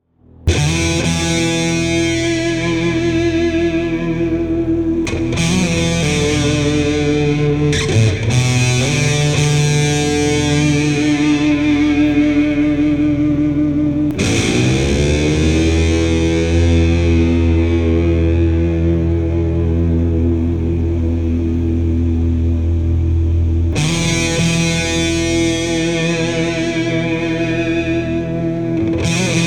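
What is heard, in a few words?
Intro, Boss HM2, Twin Reverb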